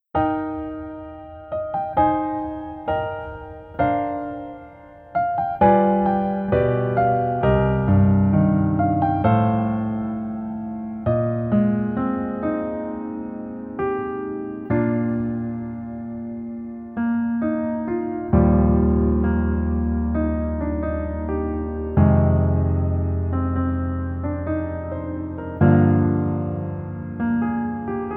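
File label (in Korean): Piano version 멜로디 MR -1키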